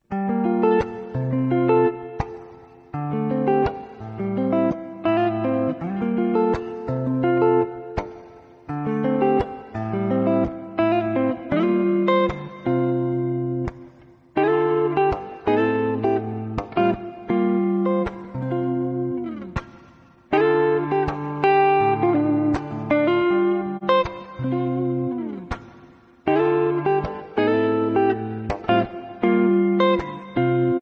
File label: relaxing music